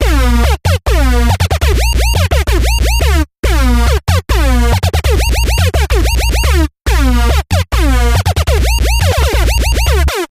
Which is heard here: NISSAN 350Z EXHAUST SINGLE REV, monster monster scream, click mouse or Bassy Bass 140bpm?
Bassy Bass 140bpm